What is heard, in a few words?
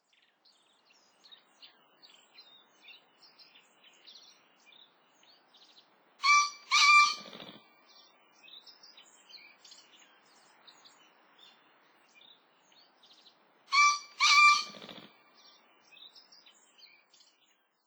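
Green Pheasant; kiji; utsunomiya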